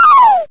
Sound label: lose; Kenney's Sound Pack; Retro Sounds 1